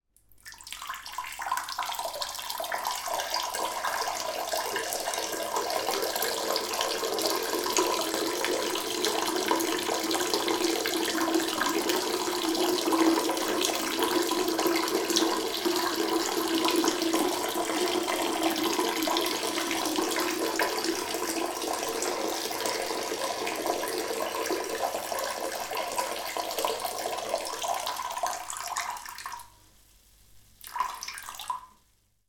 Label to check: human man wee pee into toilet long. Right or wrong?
Right